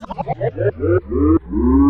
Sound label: swoosh; Loopmasters; Funky Underground Vol 2; FU2 SWOOSH FX